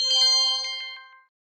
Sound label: reminder; waze